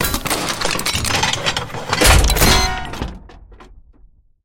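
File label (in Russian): непрерывный тревожный звон обрушения металлических предметов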